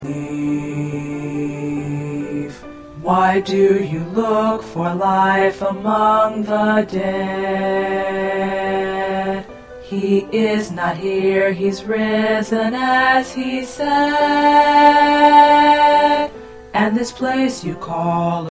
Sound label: Tenor